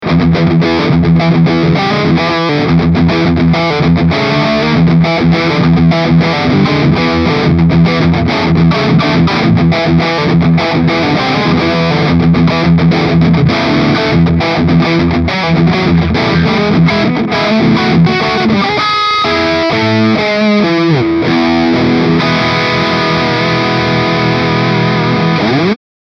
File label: Diezel VH4